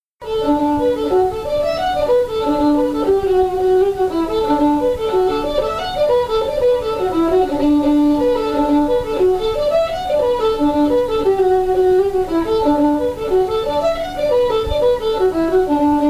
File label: wandering minstrel fiddle